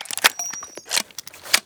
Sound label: reload revolver